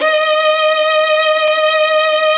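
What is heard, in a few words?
VIOLIN VIBRATO; Sound & Vision; Gigapack I CD 2; Violins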